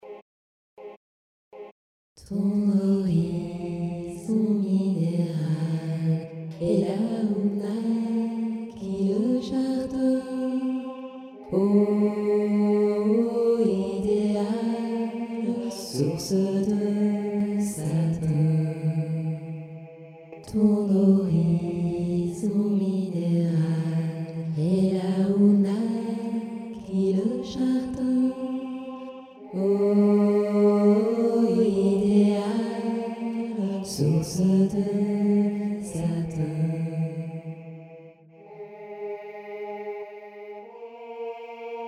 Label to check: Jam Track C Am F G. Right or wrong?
Wrong